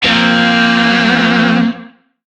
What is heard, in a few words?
Shawzin Blues Chord C; Music; DE; Warframe; Lizzie Shawzin